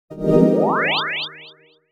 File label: shield on